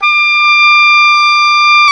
OBOE, Orchestral Winds, Oboe mf f

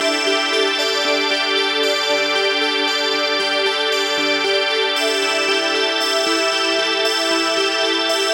D Seq Choral Keys; Zero-G; Future Wave

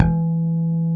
HARMONIX E3

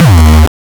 909 Dist Reso Crushed - D#2, Kicks; Drums; Mbase 11 Kicks

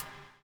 BUBL; Spectrasonics - Backbeat

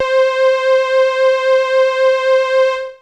303-Style single hits

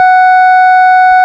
Farfisa Clarinet F#5

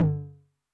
High Tune Long Decay, 909, MID TOM; classic machines; 909mod; MT - MID TOM

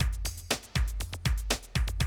Classic Drum Loops

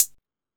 Techno A, Drum Machines, EMU XL-7

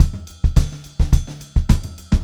VAR, Funk-Groove Drumloops

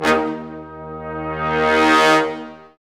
BRS, Lrg Ensemble; Roland L-CD702

